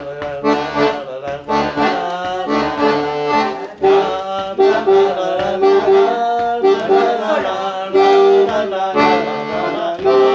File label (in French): Acc Basse Accord Do Ré; Tawes